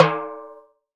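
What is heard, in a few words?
Timbales Lo Mute; Percussion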